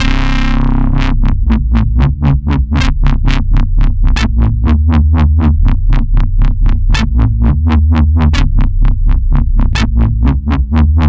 Wowwy Bass, Drum n Bass; KJ Sawka; Drum n Bass & Beyond

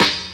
Dance Mega Drums, KIT D+B; Best Service

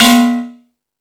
Thundadome, E-MU Pro-Cussion; drum machine

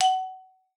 F#4, xylophone; Xsample VOL-4

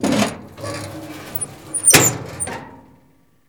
DOOR OPEN

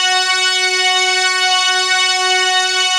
OMNIVERB, ARP Synths, Analog Odyssey

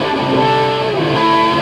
Krush, Electric Guitar

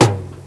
TOM, TOMS KIT, Ragga & Dub Reggae

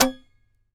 SAZ TAP; Spectrasonics; Hans Zimmer Guitars VOL-2

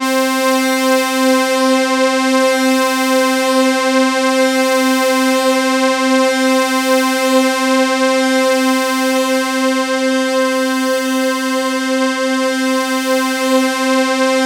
C4, Synth Line; 90 s Sample CDs; NiceBeats; SynthLine VOL-1

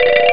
hawaiinetten; Amiga Soundtracker Sample Packs

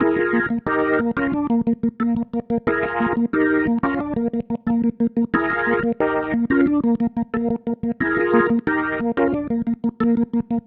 Pattern, Organ

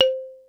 mallets

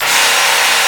Gtr Slicer; Mo' Phatt; Digital Sound Factory Mo' Phatt

